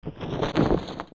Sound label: Ganondorf Cape